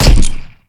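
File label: grenade launcher; FPS Game; ML-Agents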